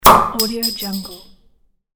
opening beer

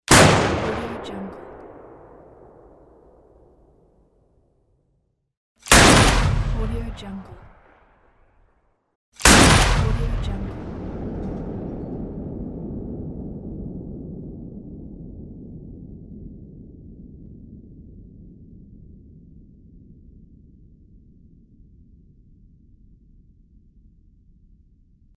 gun shot

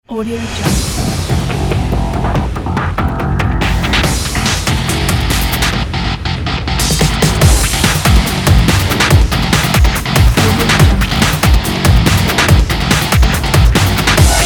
industrial insane breakbeat

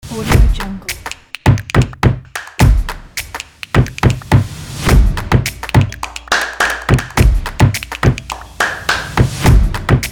to stomp hay; mak music

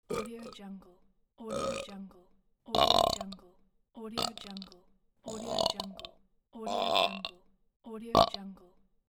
burp

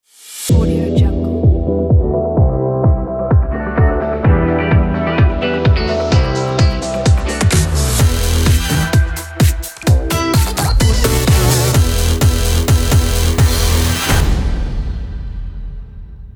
summer logo; boomopera sfx

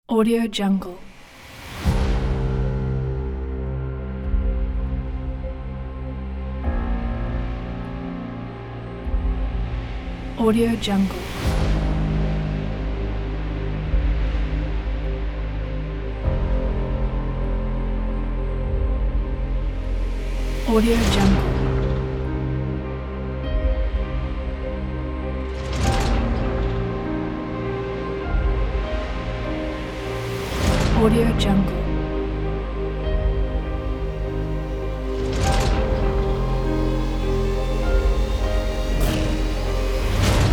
the emotional horror trailer

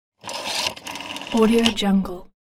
phone rotary dial seven

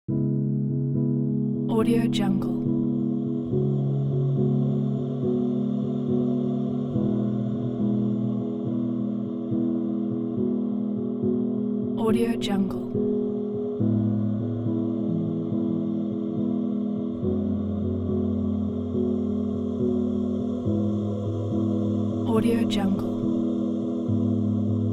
sadness cinematic piano trailer

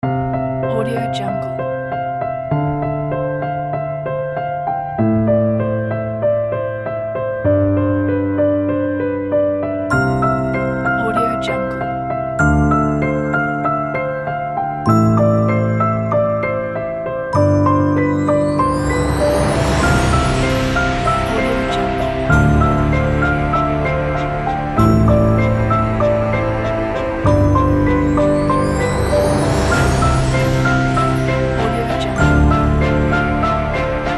dreamy

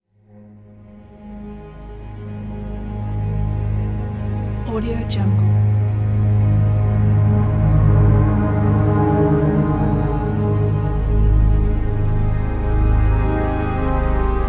orchestral ambient soundscape

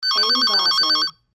phone ringtone

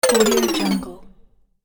players sprite move down

